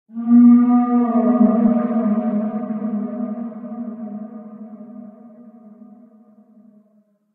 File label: whale idle; Barotraumatic; Shadowwhale